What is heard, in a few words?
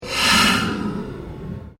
PULSE SHORT SWEEP; Sound effects created by manipulating other sounds; Sweepers; Sound Design